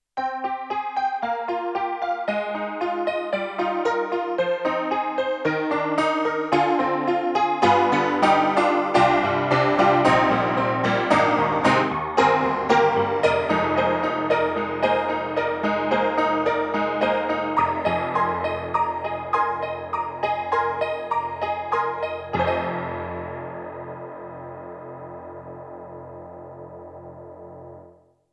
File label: YAMAHA MOTIF-RACK ES, Ragtime